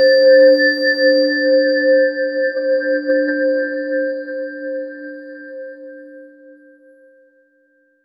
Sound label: soft wooden click, oriental